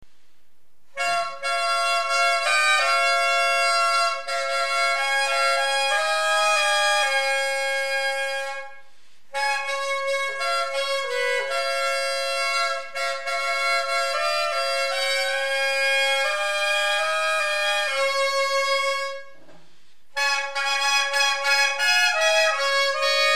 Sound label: Syrigmos, aulos